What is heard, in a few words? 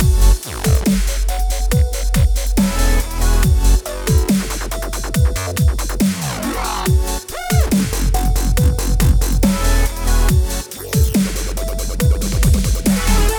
dubstep mix